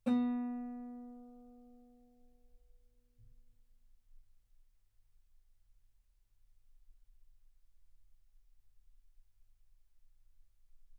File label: Guitar ff B3; sul B